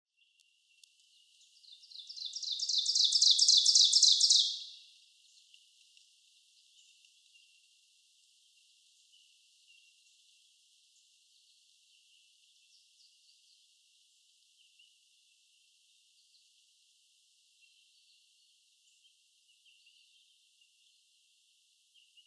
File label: Ovenbird songs